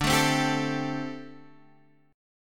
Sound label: D 7th Suspended 4th, down, Guitar, Half Step Down